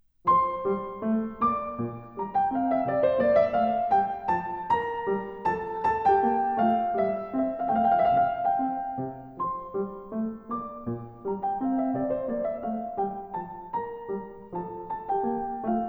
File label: Menuetto in F; 8 Menuette KV.315a